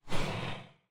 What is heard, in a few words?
Soft Slide Medium